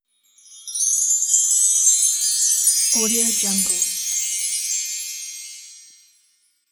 magic belltree

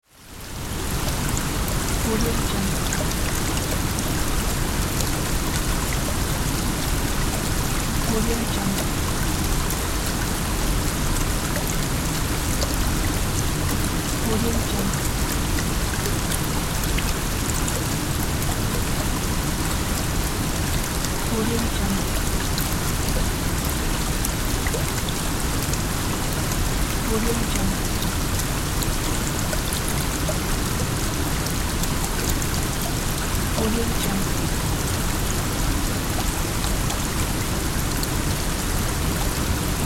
waterfall

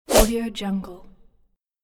quick whoosh

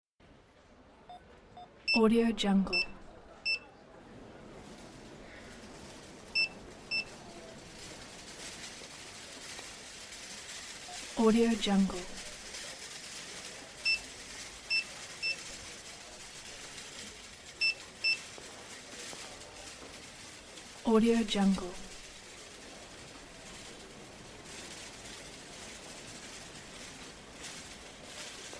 grocery store ambience

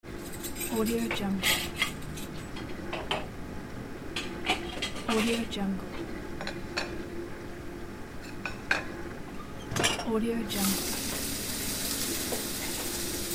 kitchen ambience

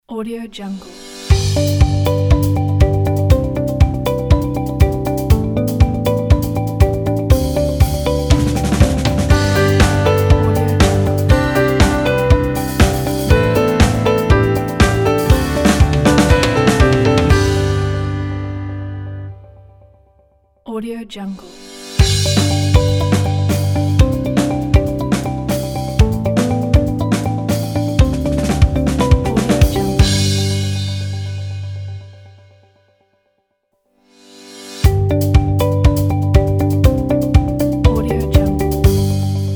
corporate logo; capybarasound

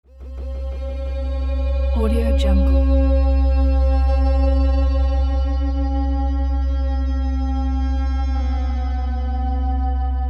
horror background noise

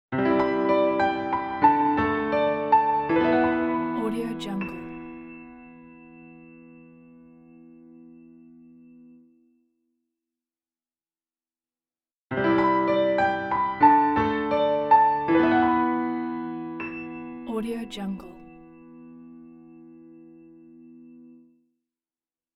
new day piano logo

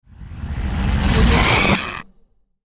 heavy hard reversed whoosh; hollywoodedge

